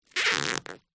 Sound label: office sticky tape stuck to floor foot or hand rub squeak